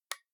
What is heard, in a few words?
switch, UI Audio; Kenney's Sound Pack